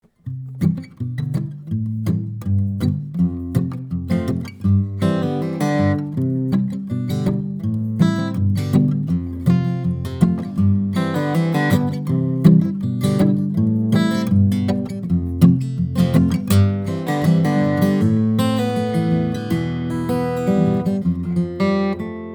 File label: Finger Pk; AMI KM100f